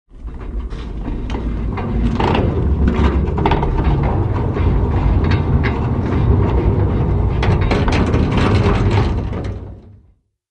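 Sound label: door sounds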